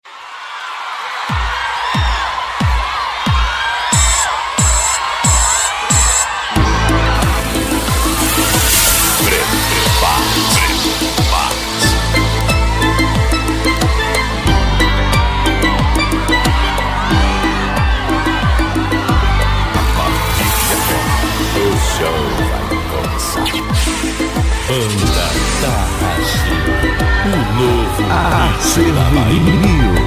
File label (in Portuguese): abertura banda